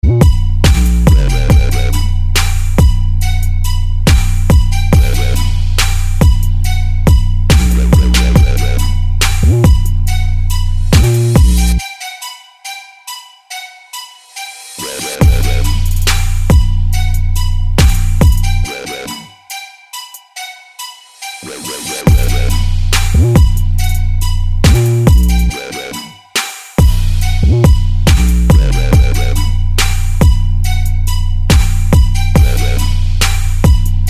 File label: Hard Bass Beat